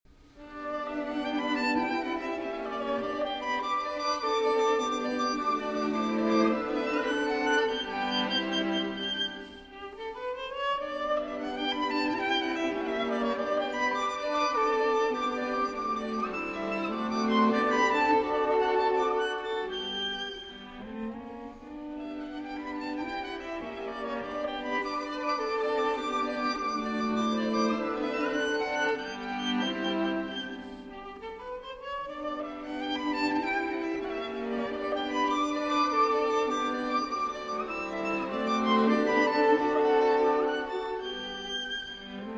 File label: String Quartet in D Minor, II. Variation 1